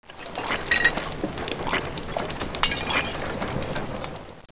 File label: pott workshop; Caesar III